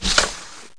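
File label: door open; My Science Fair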